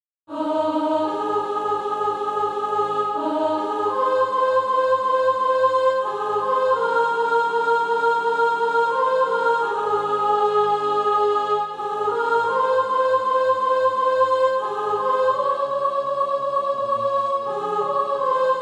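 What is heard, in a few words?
soprano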